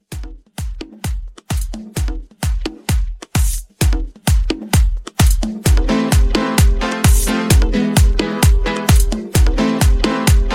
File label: Latin Club 130